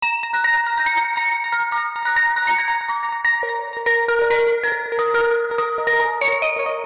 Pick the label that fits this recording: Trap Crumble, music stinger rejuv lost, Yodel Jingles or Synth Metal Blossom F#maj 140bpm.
Synth Metal Blossom F#maj 140bpm